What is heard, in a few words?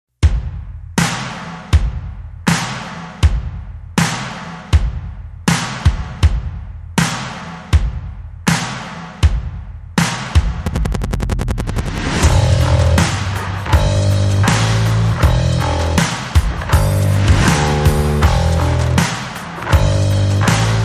Drum and Bass Only